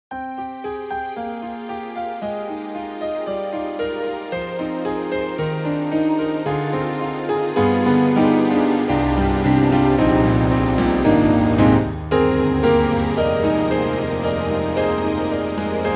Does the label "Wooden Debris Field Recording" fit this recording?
No